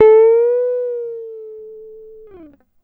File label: GTR TRIK, Jazzistic; Univers Sons